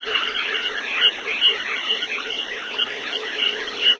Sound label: birds